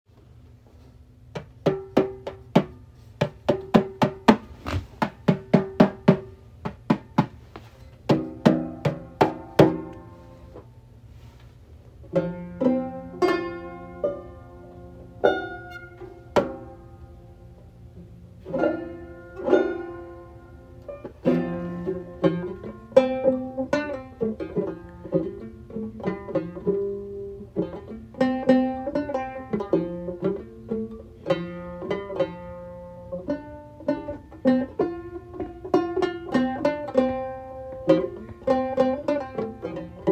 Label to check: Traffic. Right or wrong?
Wrong